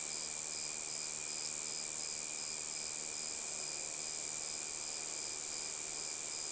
bug loop cicada; Call of Duty Modern Warfare

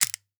python close cylinder; Rust

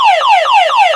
vehicle car alarm loop

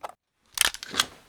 Inf Weapons Foley; Red Orchestra Ostfront 41-45

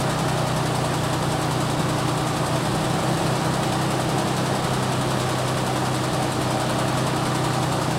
load interior engine loop, tanks; War Thunder

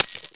glass window pane piece break; Explosions

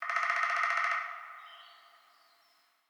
ambient winter birds

